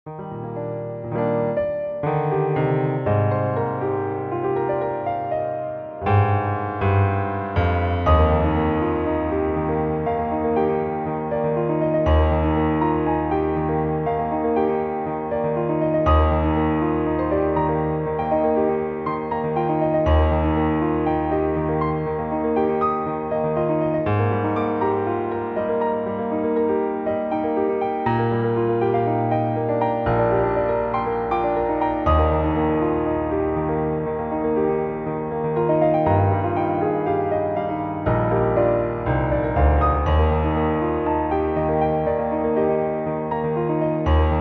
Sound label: Country Piano